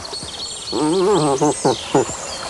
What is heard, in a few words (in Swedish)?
ljud algar